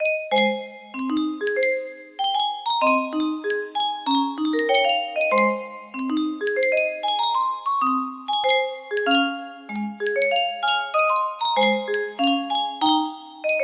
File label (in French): yunsheng boite a musique